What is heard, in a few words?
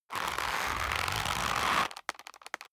leather creak medium